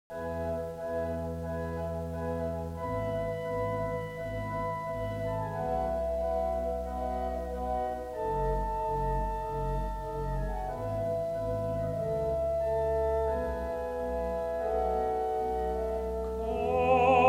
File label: vowel study voice examples vowel samples; comfort ye; 1955